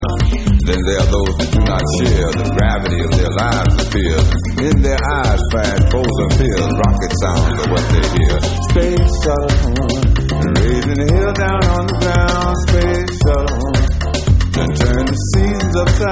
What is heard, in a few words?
Full Vocal Version